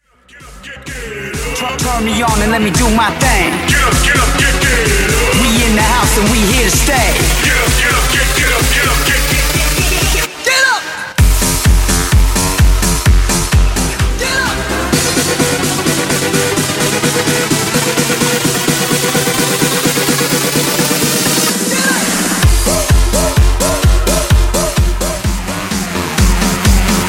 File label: Epic Mashup Party Megamix 128 Bpm